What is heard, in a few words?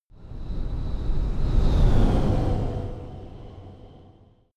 sci-fi vehicle train passing; raumschiff